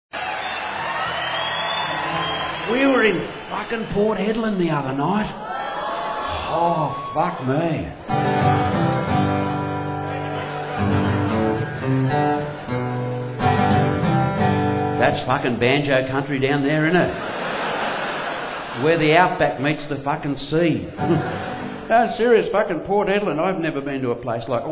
Banjo Country

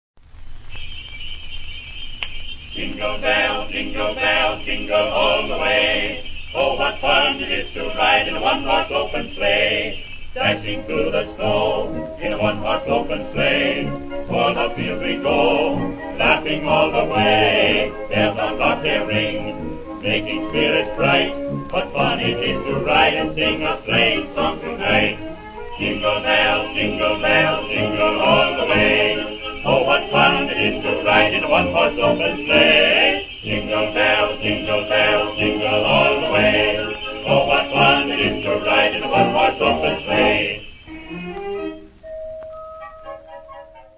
Quartet - Jingle Bells

